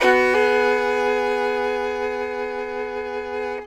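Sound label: fiddle hammer E4; Sampleheads; Whole Lotta Country 1 & 2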